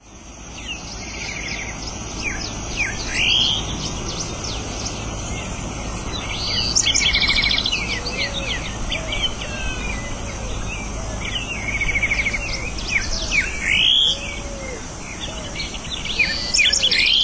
Greyish saltator

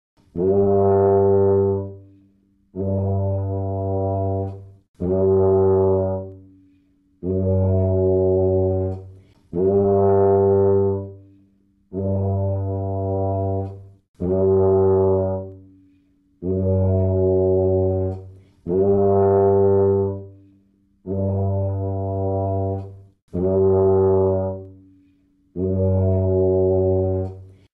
tuba; ساز توبا

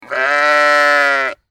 sheep

